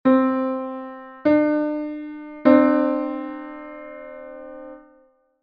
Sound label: second, third (5-EDO)